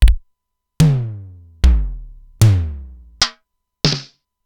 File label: Simmons SDS-9; Factory C3